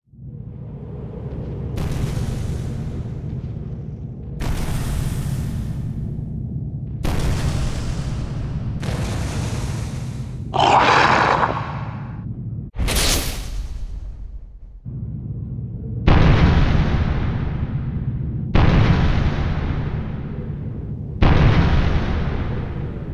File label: Appear